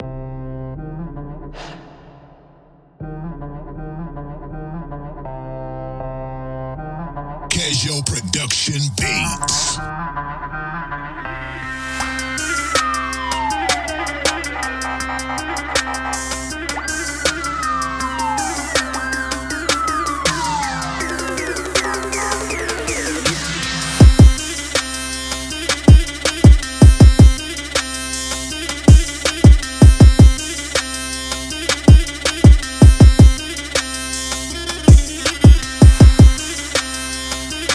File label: Beats